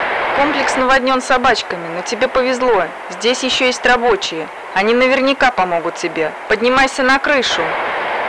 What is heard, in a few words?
radio